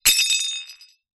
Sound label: impacts plate dish ceramic drop ground smash break